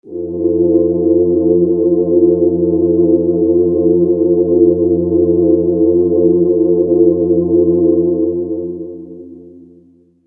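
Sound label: Synth Pad F major 7; Sonic Foundry (Sony Creative Software); Techno Synth Loops 1 Signals I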